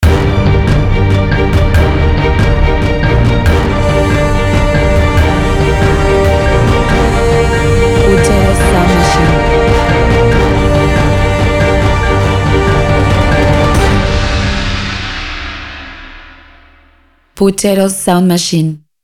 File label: escape trailer